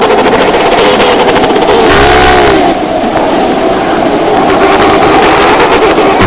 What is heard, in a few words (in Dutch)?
keizer, pinguins